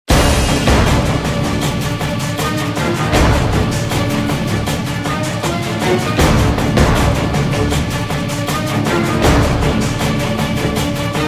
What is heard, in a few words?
battle; wot